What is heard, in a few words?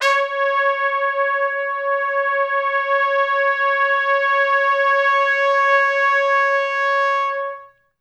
C#4 -L, Pop Brass; Best Service; ProSamples vol.19; AKAI